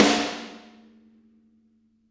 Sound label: Snare Crack